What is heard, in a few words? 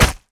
e imp saw n